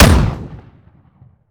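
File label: romeo870 fire player, weapons; gmod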